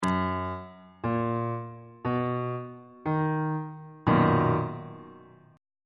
a double sharp major 7th 2nd inversion on bass clef